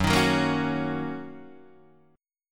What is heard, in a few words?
Fsharp 6th down Guitar Open D